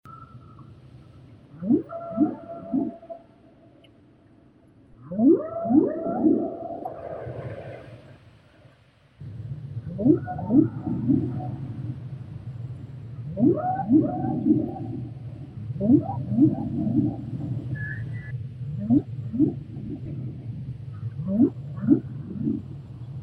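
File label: grey whale, Eschrichtius gibbosus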